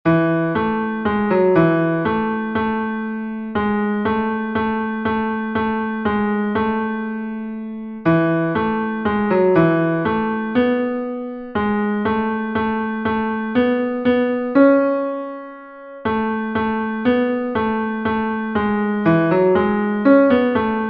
tenor, himnos en piano; cantos del camino

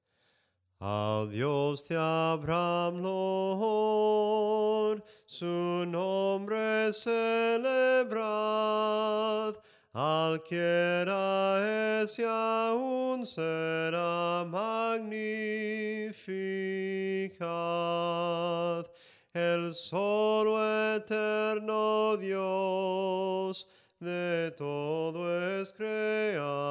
SOPRANO CANTADO, himnos en melodia soprano

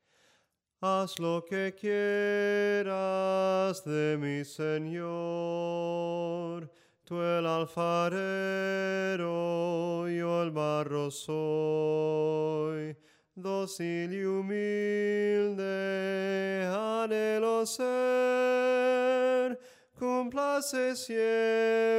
SOPRANO CANTADO, himnos en melodia soprano

